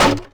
wood imp; Matt Hoffman's Pro BMX 2; XBOX

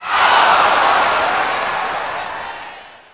ohh, crowd; MX Unleashed

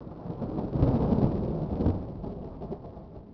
thunder; Soultree

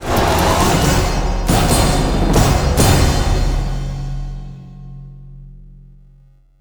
Sting

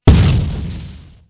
phos, phos grenade; weapons; Soldier Of Fortune II Double Helix; XBOX

